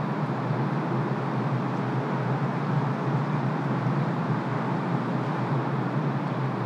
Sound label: amb bank, ambient atmosphere